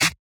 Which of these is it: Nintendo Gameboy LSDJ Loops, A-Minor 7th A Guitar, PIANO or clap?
clap